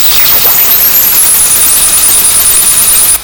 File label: HAHA; loud warning